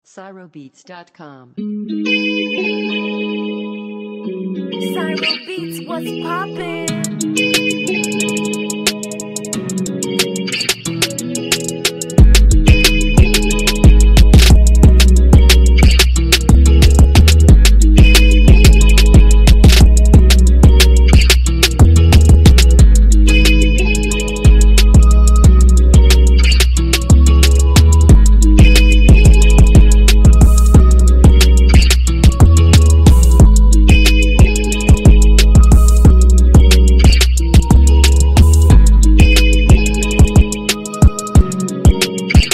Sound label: Trap RapTrap Instrumental